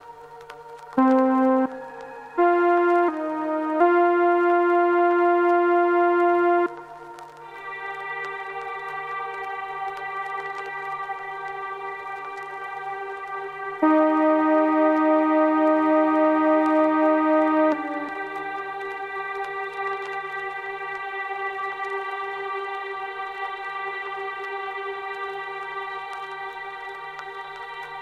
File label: quiet melodic